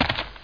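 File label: hit ground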